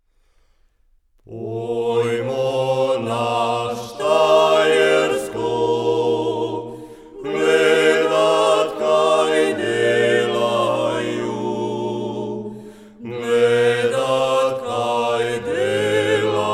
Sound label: acappella; Pojmo